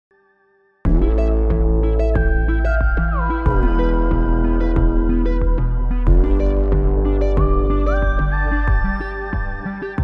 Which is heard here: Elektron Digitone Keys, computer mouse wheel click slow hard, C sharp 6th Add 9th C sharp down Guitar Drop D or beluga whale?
Elektron Digitone Keys